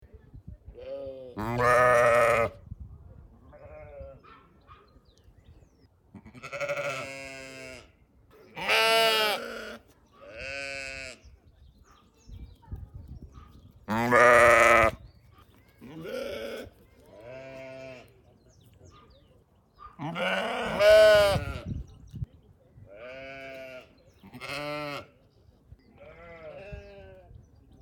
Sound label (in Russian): ovcy i barany otara; Звуки, которые издают овцы и бараны